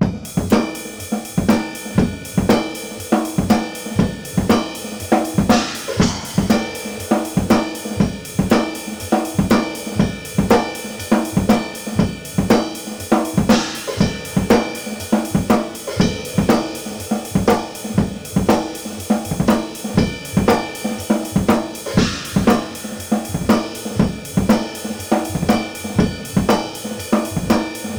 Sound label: ride funk 120 loop